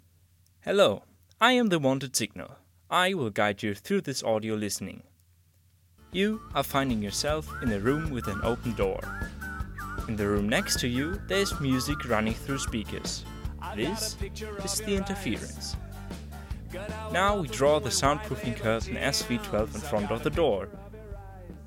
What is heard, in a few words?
Auralisation sample music